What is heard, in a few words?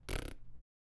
Stick Out Tongue